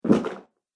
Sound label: Body Impact - Blood And Guts